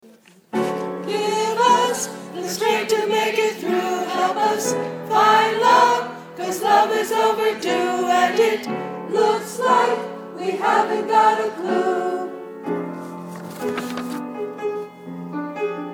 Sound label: All parts together